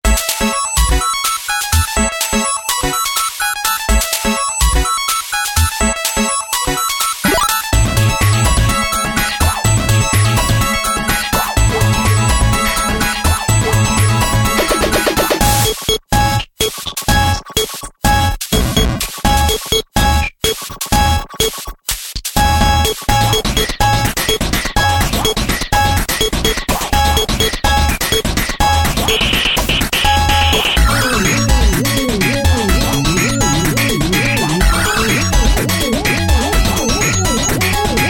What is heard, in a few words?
8 Bit Family; DISCOVERY SOUND